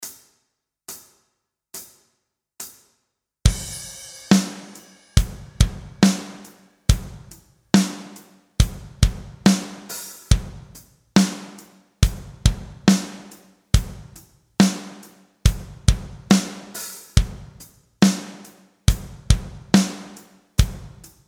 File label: Backing Track 70 bpm; guitar strumming